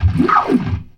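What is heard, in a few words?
glissando, LOWER MANUAL; InVision Interactive; Keith Emerson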